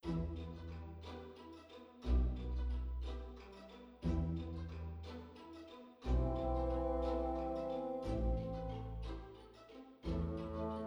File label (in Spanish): LENTO CON TROMPA, Lento con trompa solista